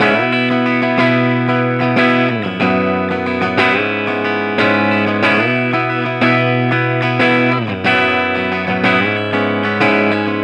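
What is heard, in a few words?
rhythm guitar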